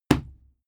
fight impact sweetener cloth; nahkampf; soundpool

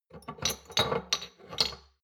shot glasses pull out medium, gastronomie; berufe; soundpool

